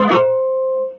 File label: Guitar Electric Failstate; Instruments